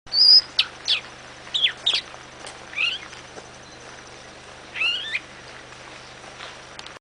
Canto do mimao de galapagos

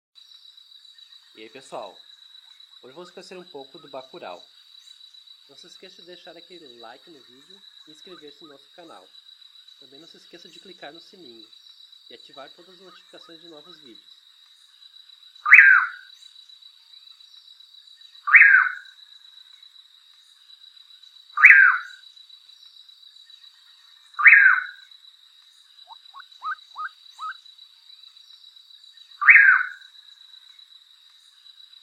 O CANTO DO BACURAU

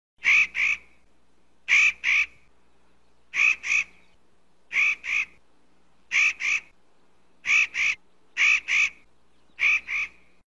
Canto do quebra nozes

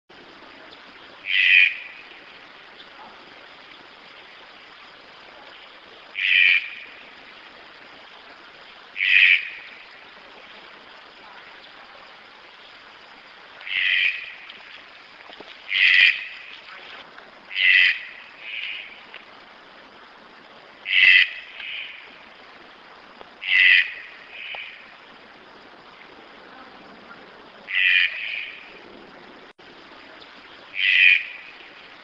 Canto do Tucano da Garganta Amarela

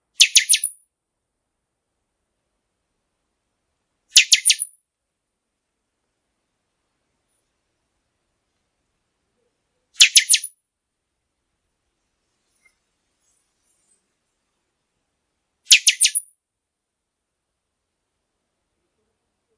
Canto do Pixoxó

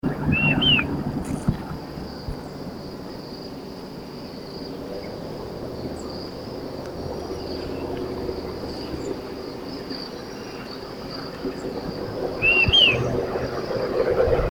canto da maria te viu

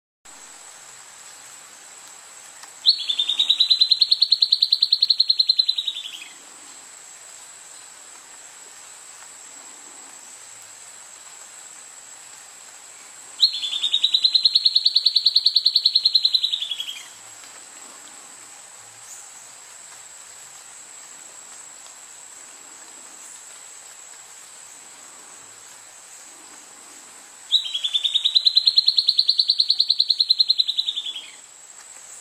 canto do papa formiga do igarape

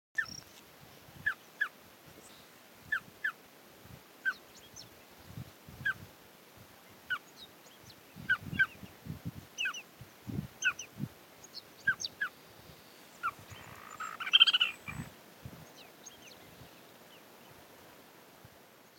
Canto do Sana cinza

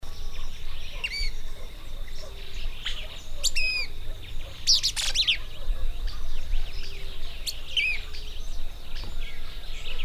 canto do tuque pium

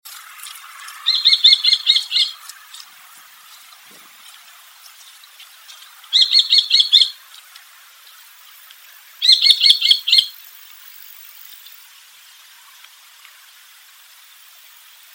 Canto do Peneireiro Vulgar

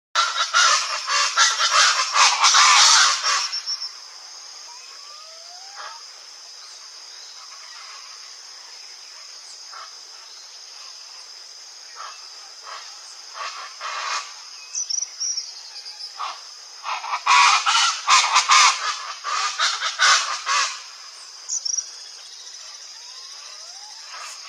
canto do aracua do pantanal

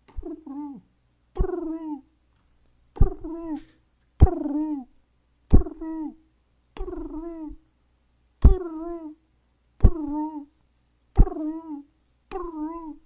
Canto do Pombo domestico

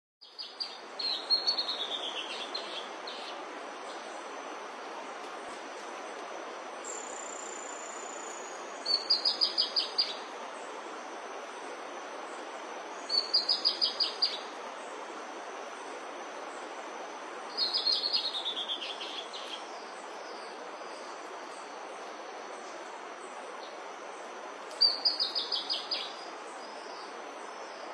Canto do trovoada

